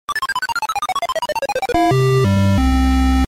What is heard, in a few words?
Game Over